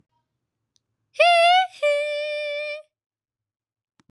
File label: hee hee